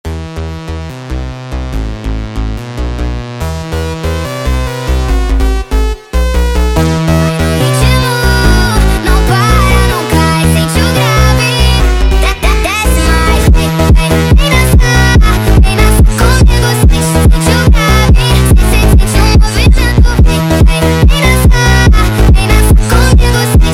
sped Up, funk

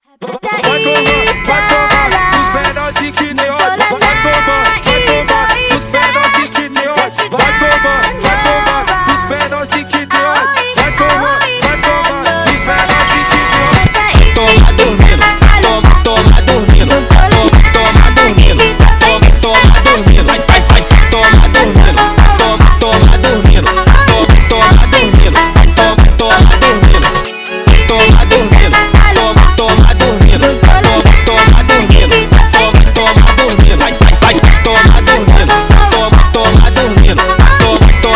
Funk

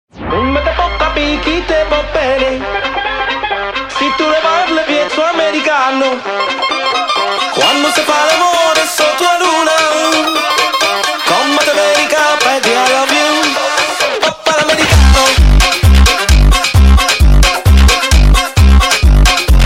sped Up

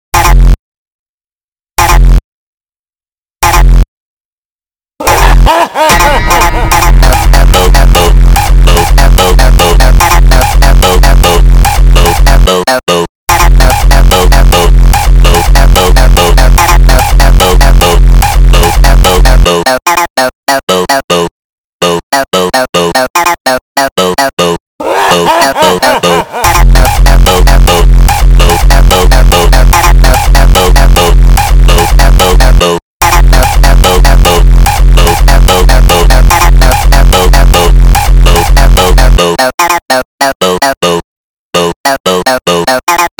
Hardstyle, funk

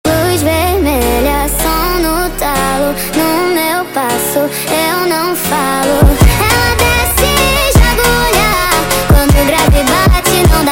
sped Up; آهنگ فانک

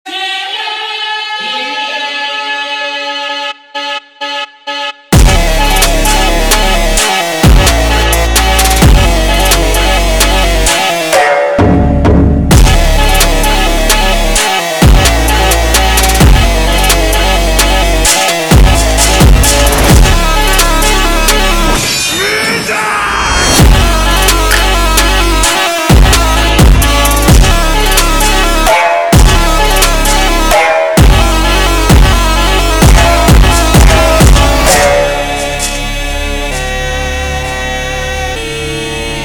super Slowed, funk

